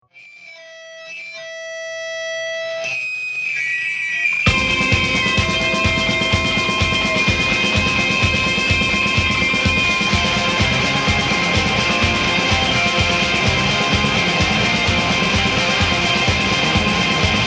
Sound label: Rock Music